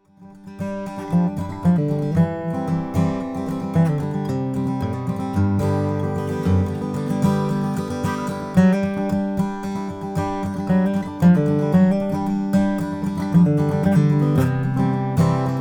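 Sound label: froggy bottom sj deluxe sunburst, guitars; quilted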